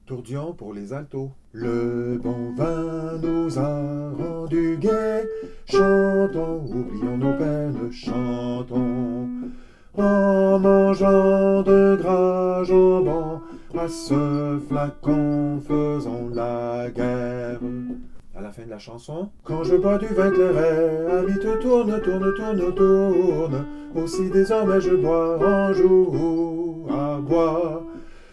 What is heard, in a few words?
tourdion